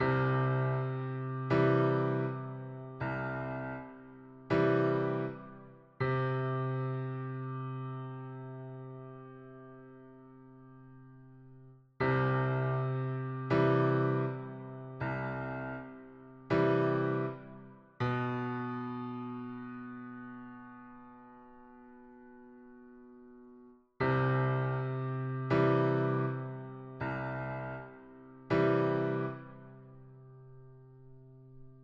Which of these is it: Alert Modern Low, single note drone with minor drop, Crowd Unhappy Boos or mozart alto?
single note drone with minor drop